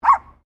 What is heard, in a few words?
Animal Dog Poodle Miniature Bark; Various domestic dogs; Dogs (Sound Effects:Animals)